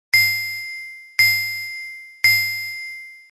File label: SMS RINGTONE 3D BELLS RINGTONE